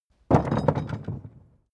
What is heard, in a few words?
carriage hatch single